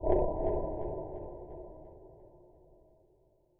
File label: Creepy Croak